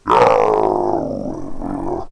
growl; shamblie; npc

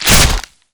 demon claw strike; nh demon

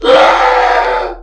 alert

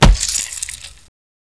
claw strike

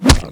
claw strike; shamblie; npc

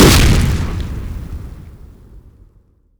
explode

